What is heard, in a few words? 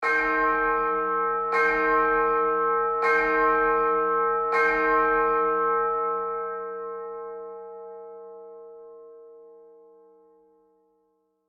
Steady church bells ringing